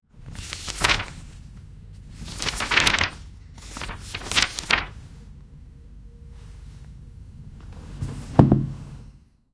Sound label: pages turning; document